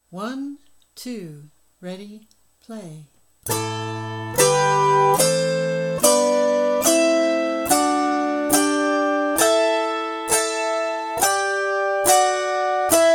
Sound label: Dorian Mode Scale